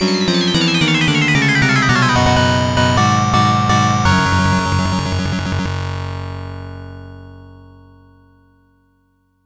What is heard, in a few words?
arcade game over; ghosts n goblins